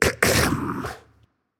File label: clear throat; smite; voicepack; odin